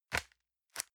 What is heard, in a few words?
envelope grab release medium